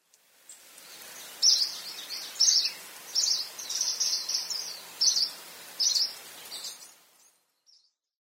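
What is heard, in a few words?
willow warbler, sakata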